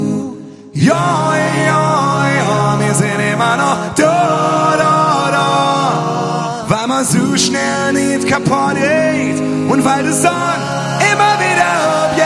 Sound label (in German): live mit orchester